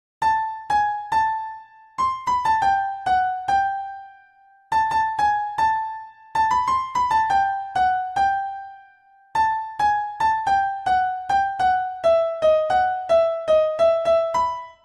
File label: bgm piano cover; with notes; bgm piano keyboard tutorial; velayudham